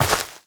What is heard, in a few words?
step, footsteps player grass